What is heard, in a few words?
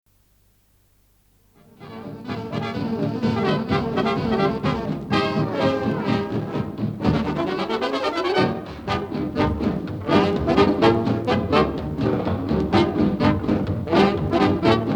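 jazz; Звуки джаза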